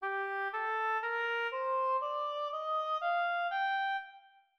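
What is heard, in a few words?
natural minor G4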